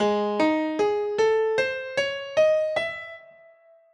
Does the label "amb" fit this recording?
No